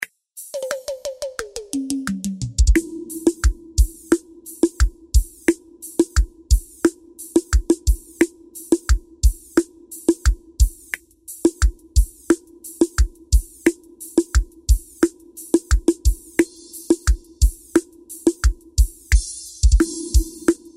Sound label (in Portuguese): FORRO BPM88